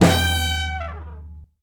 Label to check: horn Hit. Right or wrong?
Right